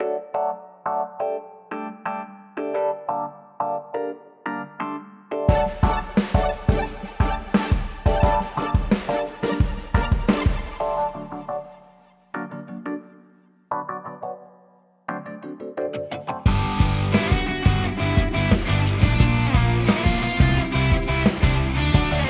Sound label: Instrumental; Rhythm Doctor OST